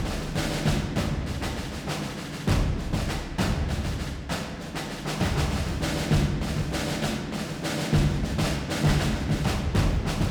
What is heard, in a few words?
Combat Human Guard; Dungeon Hunter; android game music